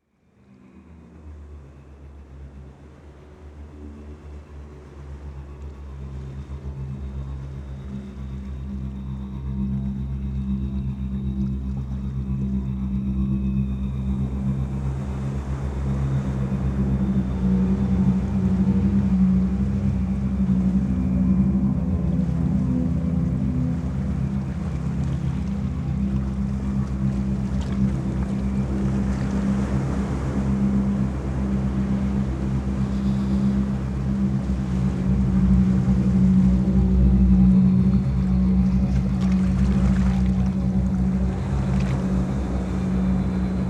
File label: Ambient Ocean